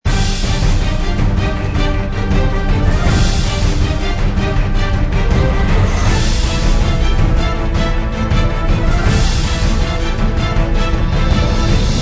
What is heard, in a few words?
battle bg; Strong World D; Android